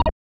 Slot Menu Back

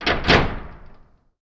Trapdoor Shake

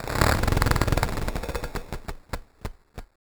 Slot Machine Rolling Tick

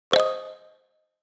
Menu Notification

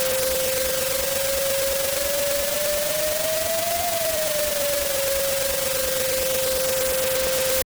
Sound ATM Death Scary

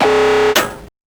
Sound Reward Box Trigger

